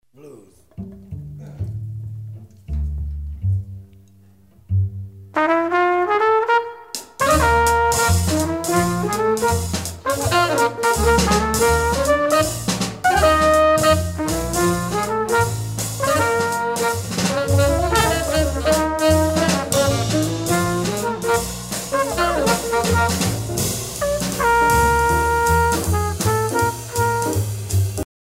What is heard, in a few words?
blues; B-flat